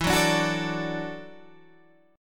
E 7th Suspended 2nd Suspended 4th, Guitar, Standard; strummed; chord; JGuitar